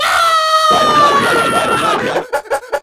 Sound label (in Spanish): Grito; Garden Snatcher